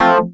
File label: wurlitzer, dx7